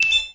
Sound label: candy Win Start; fairyhansel